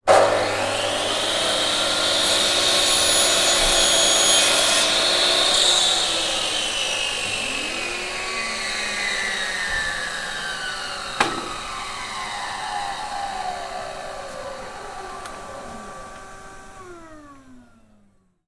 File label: industrial power tool circular saw on operate short off